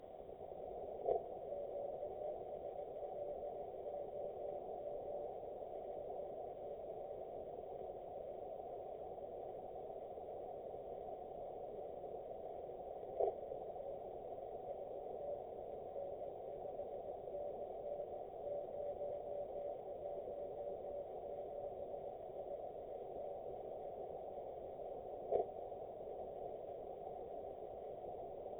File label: 1296MHz 767km; F6KFH